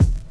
studio kick D# key, Royality free kick drum single shot tuned to the D# note. Loudest frequency: 224Hz; D sharp key; one shot